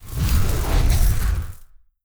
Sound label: hive minions moving out; Stellaris; guardians